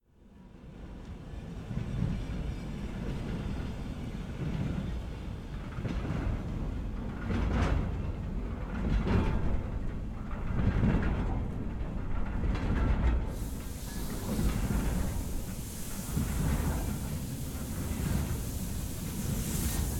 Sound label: steam locomotive